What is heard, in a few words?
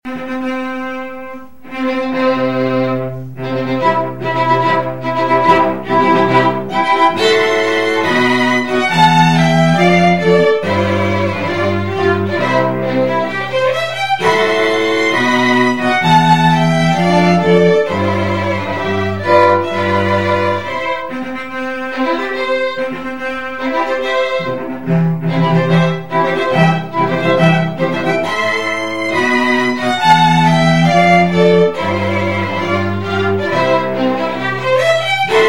Strings, SQ Wedding March; Mendelssohn